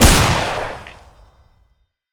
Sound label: Gold blaster shoot